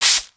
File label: Spray 1 Shot; Weapons